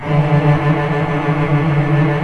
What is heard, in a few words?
CELLI M TRLL; OMI - Universe of Sounds